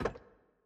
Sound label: Monkey Stuck Jaw Pin; Puzzle